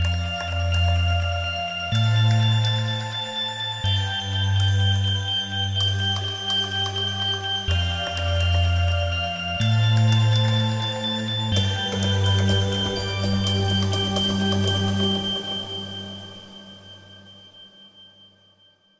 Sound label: new nice trance